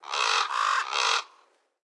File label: Ambience Menu Crow; Subway Surfers City; Android, iOS